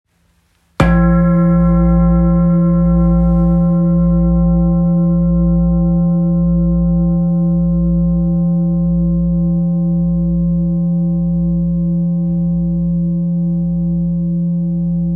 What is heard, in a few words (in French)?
gros bol La 9.34kg